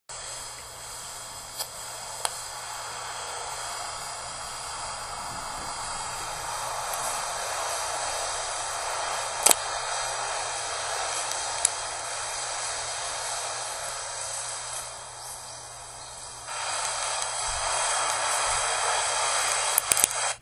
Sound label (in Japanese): 竹のチップ化作業